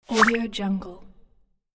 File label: appear woosh